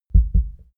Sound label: heart beat double